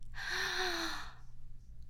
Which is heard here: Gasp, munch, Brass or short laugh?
Gasp